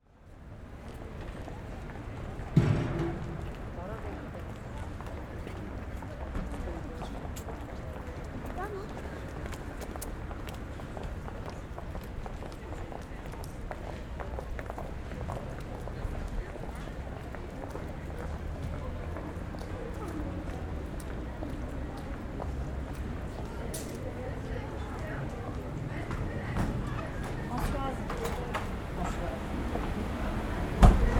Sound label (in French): Souterrains Halles Parcours 2bis porte du jour Porte du pont neuf